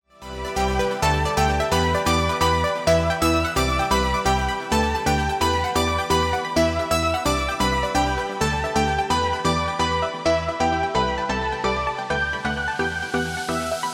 choose team; music kits custom

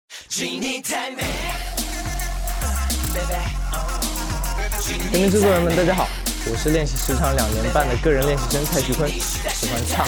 start action; music kits custom

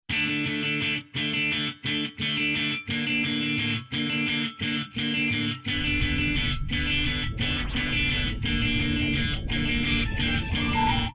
start action; music kits custom

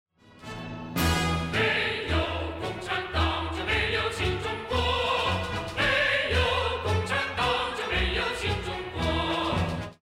round ten sec count; music kits custom

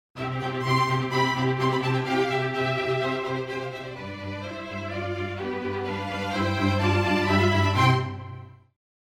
round ten sec count; music kits custom

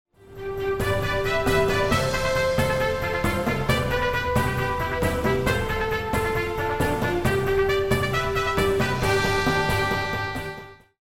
bomb ten sec count; music kits custom

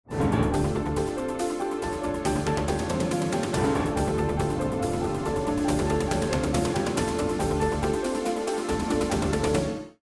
round ten sec count; music kits custom

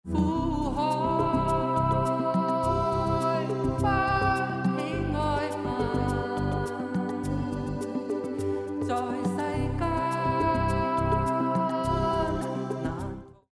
lost round; music kits custom

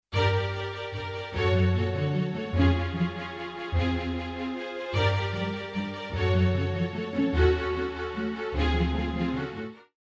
round ten sec count; music kits custom

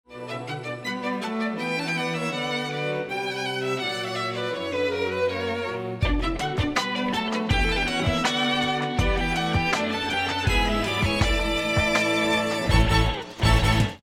won round; music kits custom

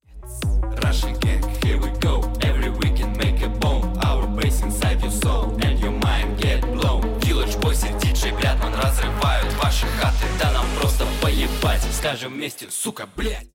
won round; music kits custom

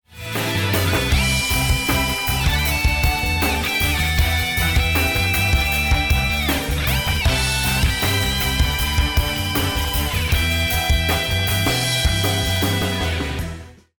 lost round; music kits custom

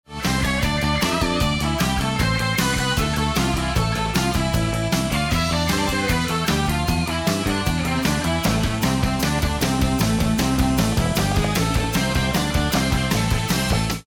won round; music kits custom

